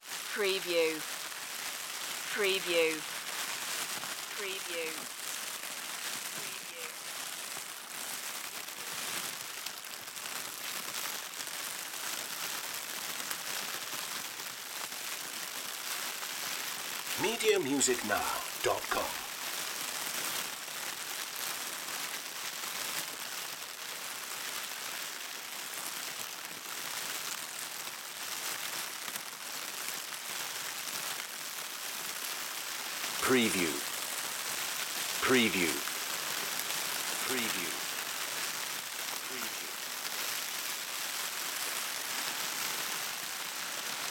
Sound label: trash bag rustling